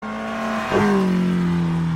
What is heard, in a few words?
Win